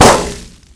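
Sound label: grass; Mafie 1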